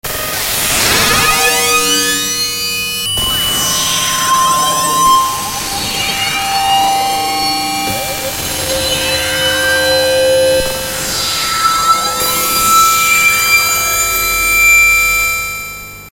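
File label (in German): kamm sequence, waldorf synth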